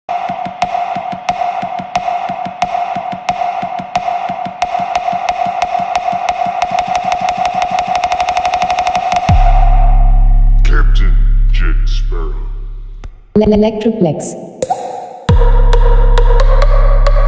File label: psy trance